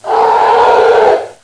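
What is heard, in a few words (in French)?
éléphant; MOBICLIC 12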